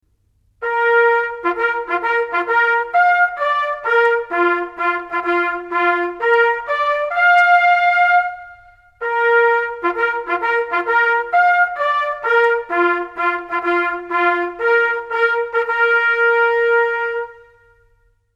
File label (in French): Extinction des feux, Sonneries reglementaires